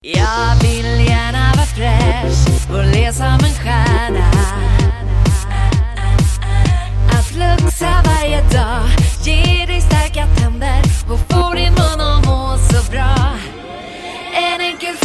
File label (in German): schlager